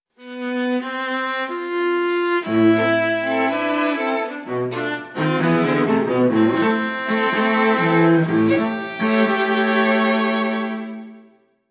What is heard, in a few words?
Tango Sting